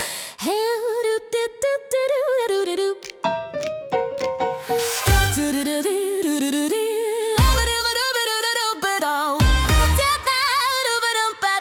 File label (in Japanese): Swing, 女性Voのエレクトロスウィング新体操曲; Rhythmic Gymnastics Music Shima; 1930年代のスウィングと現代のビートをミックスした、おしゃれでノリノリなエレクトロスウィング。女性ボーカルが華やかさを添え、ジュニアからシニアまで幅広く使えます。